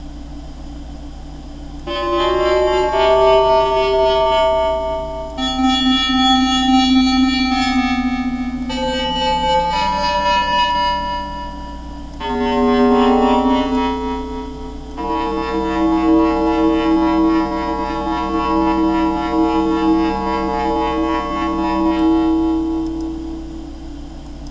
scifi

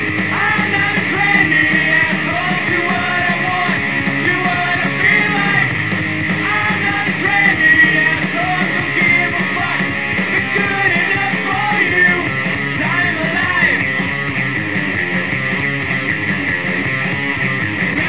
SMASH

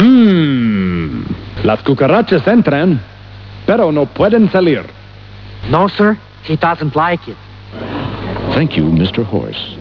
las cucarachas

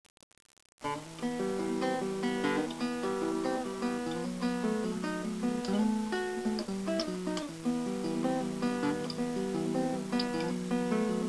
Guitar

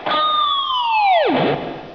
dive

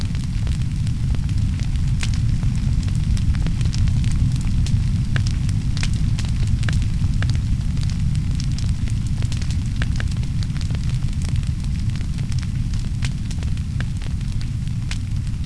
campfire

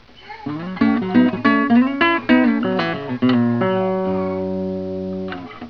blues

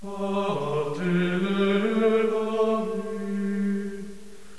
chant

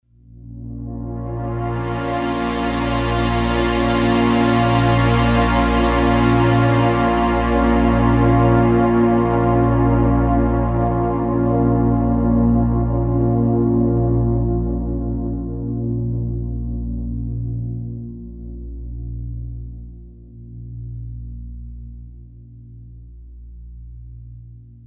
strings

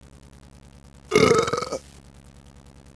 belch; burp

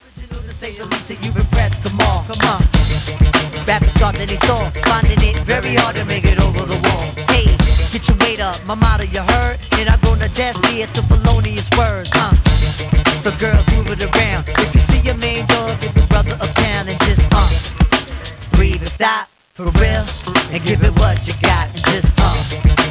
breath

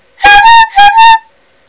horn; M25 on the way to the Wingate & Finchley away match

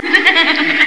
laugh

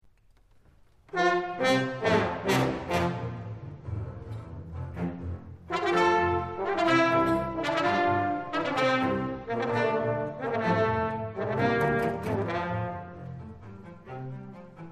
duet; Duetto

